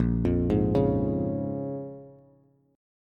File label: Bb-Minor 7th-Bb-down-Bass; Bbm7 arpeggiated